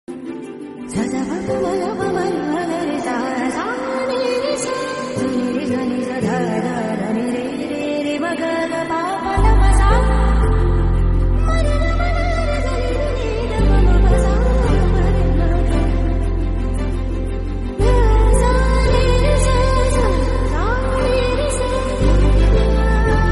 classical bgm; aravindante adhithikal

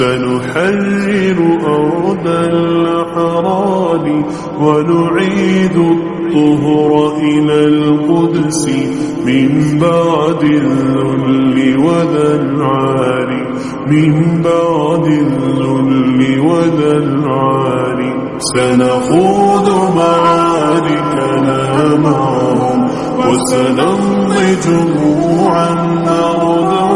naat

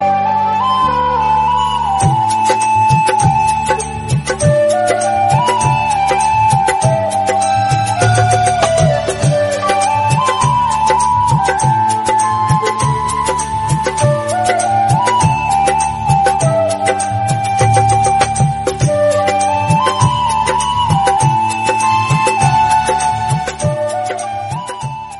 bgm background music theme

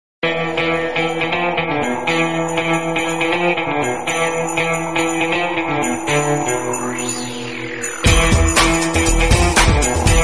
bgm; Don; Sivakarthikeyan

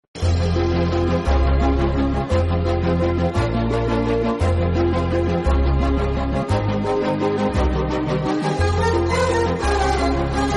love bgm instrumental; nithiin

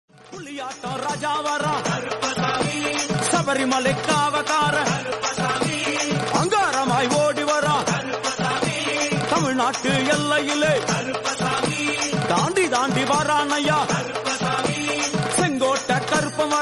tamil

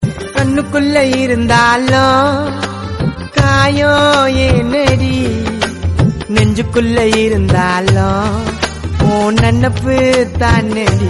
gana tamil remix

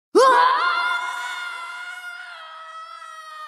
death scream; mercy

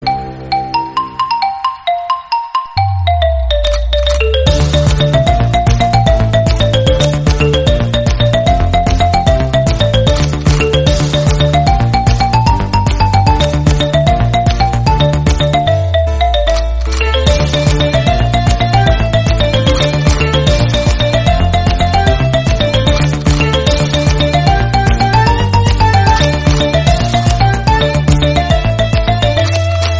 bgm, telugu; pirates of the caribbean

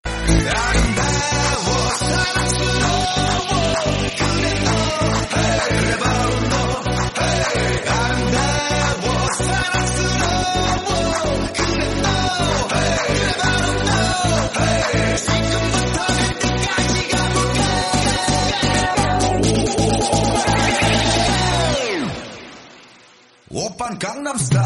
remix

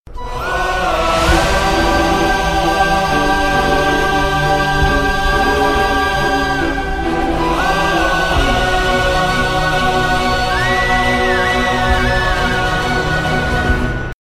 flute instrumental; krishna; mahabharat

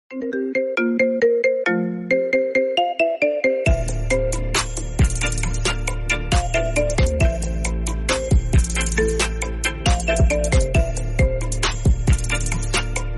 instrumental bgm

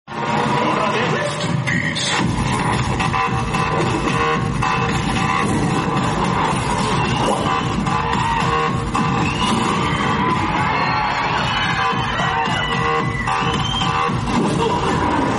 bgm

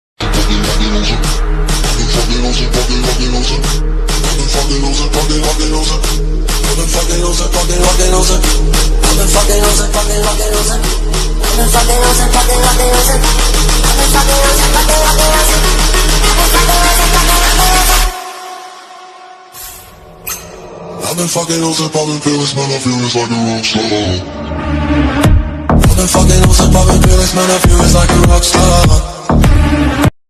Psy trance trippy; Rockstar

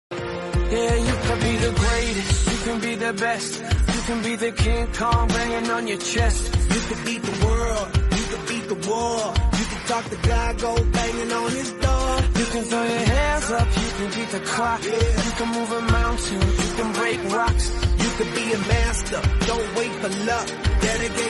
motivational song

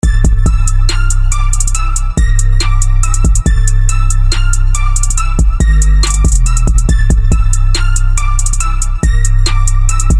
trap beat

